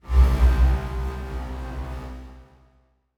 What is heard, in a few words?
Transition Metallic Drone